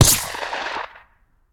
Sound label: Bullet Impact; Sound Ideas; Guns